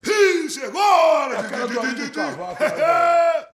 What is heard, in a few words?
BRAZIL, World Voices; ILIO; Vocal Planet VOL-5; RIO MADMEN